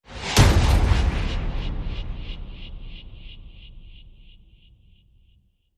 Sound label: Hit Delayed